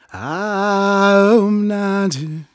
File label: Vocal Chirp, African Voices